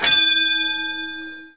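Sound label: school bell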